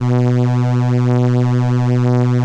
ELKA, String Machines; ELKA RHAP

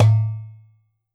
WORLD DRUMS MIXED DRUMS

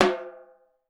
repique; Definitive Percussion

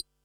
HH, Pearl SC-40 Hihats and cymbals

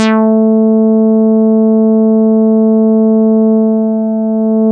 Synths BASS

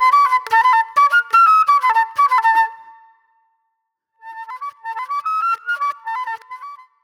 Movie Flute

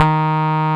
Roxichord, E.Piano

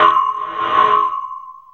METAL, METAL PERC; Zero-G Ambient 1

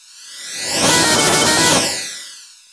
REVERTED; Spectrasonic Distorted Reality 1

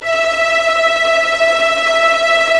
STR SEC; Roland - LA Composer vol. 1

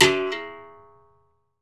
STICK MUTE, Berimbau; E-MU Producer Series Vol. 2; More Studio Essentials; Composer; Berimbau Pallet

